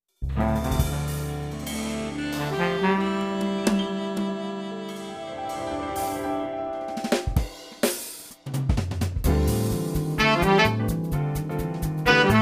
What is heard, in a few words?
jazz